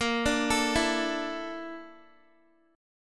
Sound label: Bb Major 7th Flat 5th Bb Guitar; arpeggiated